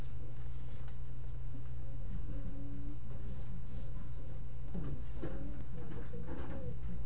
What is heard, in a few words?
bottle